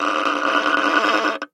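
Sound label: sounds of metal cable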